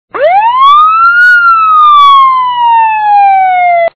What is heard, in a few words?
page